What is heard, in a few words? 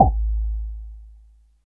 MOD SNARE; East West - Phat & Phunky